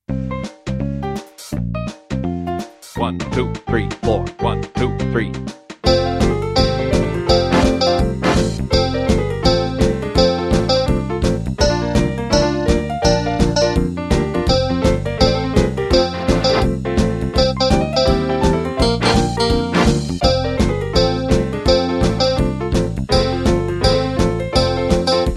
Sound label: Jazz